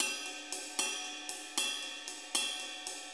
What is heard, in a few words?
6-8 Ride, HiHat & Ride Loops; Sonic Foundry (Sony Creative Software) - Loops & Samples Drum Tools